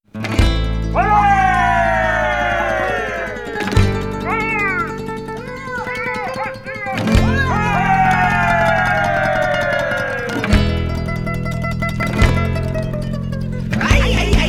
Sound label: Flamenco Stomp